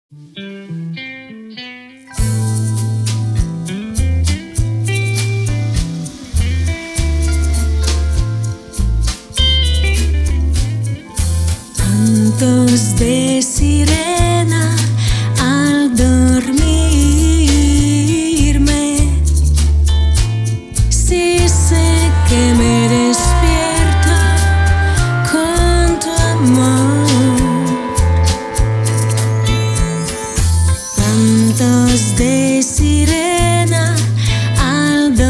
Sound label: Rumba